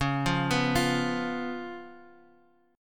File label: B Suspended 2nd Flat 5th, C sharp, chord